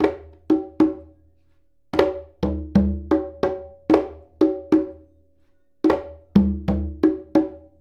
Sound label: Congas; Essential Percussion